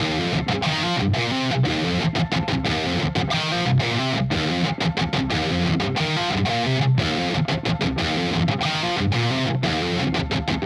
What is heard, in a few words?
loop F 90bpm